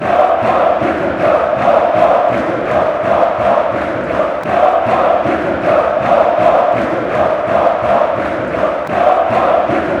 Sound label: rapid chant